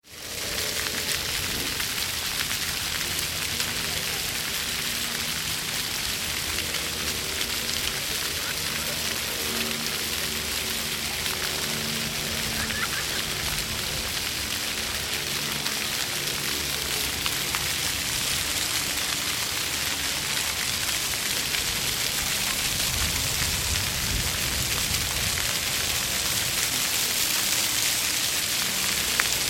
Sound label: fountain court; somerset house